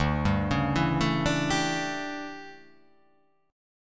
D Major 7th Suspended 2nd Suspended 4th D

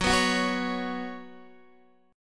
F sharp Suspended 4th, Guitar, DADGAD

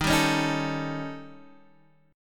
Eb 7th Flat 5th Eb down Guitar Standard; Eb7b5 strummed; JGuitar

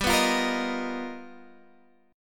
G 7th Sharp 9th Sharp 5th G chord; G7#9#5 strummed; JGuitar

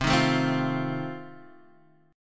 F 7th C

